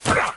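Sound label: barbarian footstep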